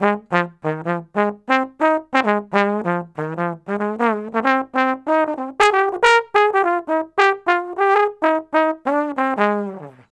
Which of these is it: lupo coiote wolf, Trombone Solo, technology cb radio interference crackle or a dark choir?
Trombone Solo